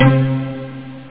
pizza

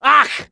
mail; COMMANDOS2

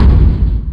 Menu Command; Quake II

